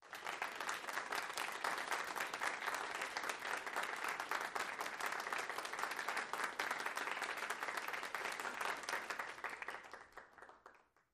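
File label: Crowd 30 Steady Polite, small interior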